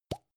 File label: gum plastic box open